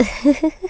Giggle; Uli giggling

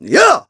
Attack; Ghirahim; zelda sounds; Skyward Sword

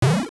Boss Jump; A boss jumping (also Hinox beating its chest and Boulders falling)

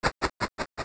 Stairs; Walking on stairs (entering/leaving a cave or dungeon)

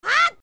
Young Link Attack; Link's attack shouts (sword slashes, rolls, or targeted jumps); OOT

